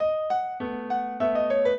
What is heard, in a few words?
minuet, piano; Mozart